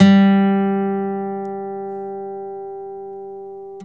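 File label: G-STR, guitar tuning; TUNNING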